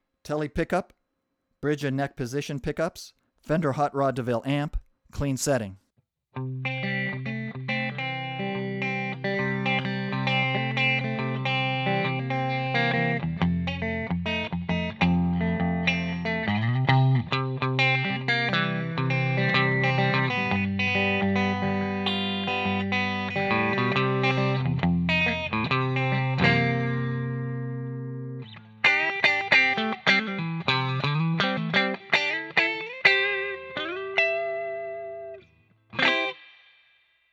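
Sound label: tele bridge neck hot clean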